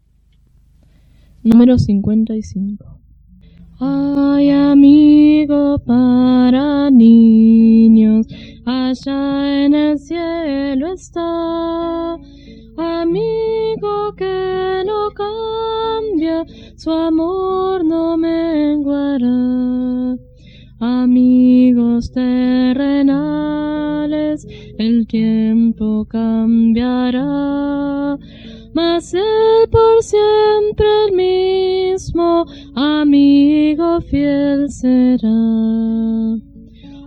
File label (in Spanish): Coro, himnario niños